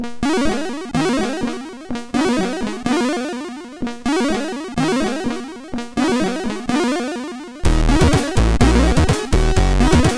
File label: Jingle Funky Balls, MOS6581R2